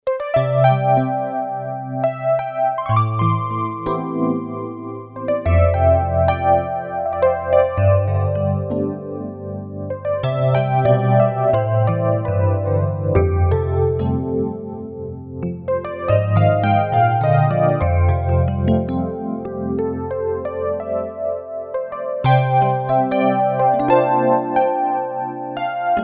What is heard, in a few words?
TREM FM EP, Roland SC-880; Factory Patches